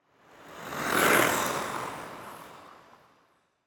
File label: Ice Skate Pass By; Blastwave FX